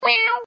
Cat Meow

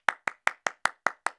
claps

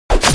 slice

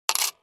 coin

